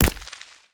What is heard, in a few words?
sand trap